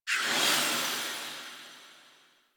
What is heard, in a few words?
Hub Menu Close; Infested; DE; Warframe; Deimos